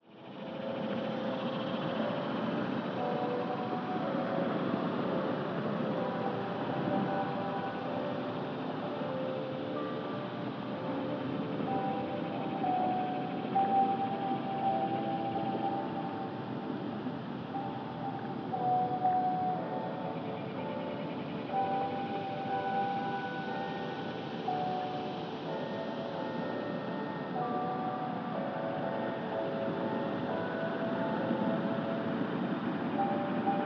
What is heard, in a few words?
church bells